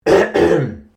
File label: MALE CLEAR THROAT; Clearing Throat; Human